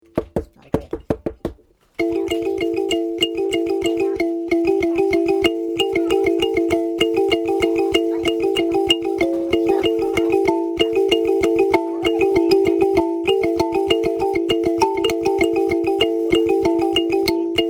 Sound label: mbira adventure